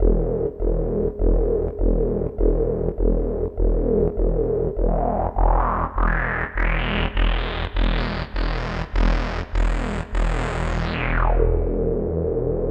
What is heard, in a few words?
synth, Hardcore Breakbeat; Big Fish Audio; Hardcore Breakbeat Action; Rhythms 4 Life 151 Ebmin